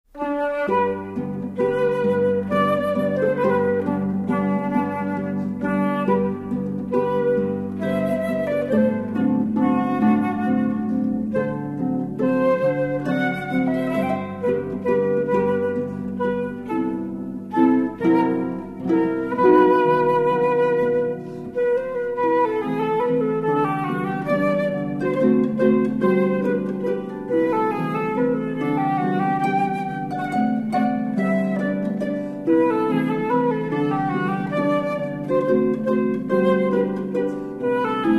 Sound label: Waltz Duo, Harp